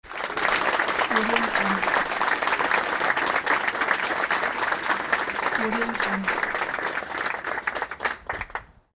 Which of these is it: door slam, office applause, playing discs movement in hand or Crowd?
office applause